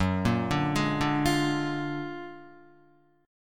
Gb Augmented Major 9th Guitar; Gb+M9 arpeggiated; JGuitar

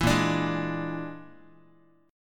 Bb 7th Flat 5th Bb Guitar, chord; strummed; 7,6,5,6,x,x; 4A,4E,5A,5D,5G#,6C#; Bb7b5

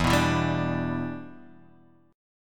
E Minor 7th, down

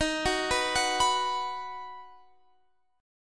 Eb Minor Sharp 5th Guitar Standard; Ebm#5 arpeggiated; JGuitar

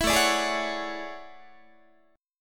A Major 13th Guitar; AM13 strummed; 4A,4E,5A,5D,5G#,6C#; 19,17,17,18,17,17

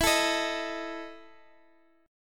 E Major 7th Suspended 4th E down

